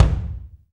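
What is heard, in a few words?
bass drum, Roland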